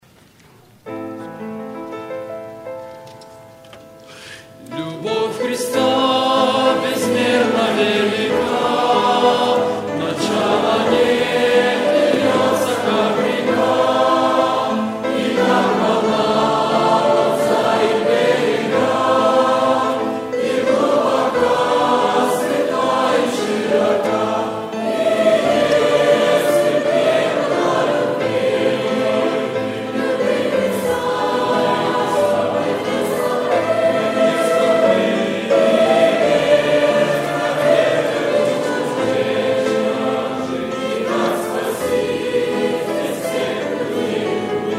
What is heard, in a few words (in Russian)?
Obshee penie; молодёжное общение Калифорнии